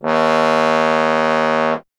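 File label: bass trombone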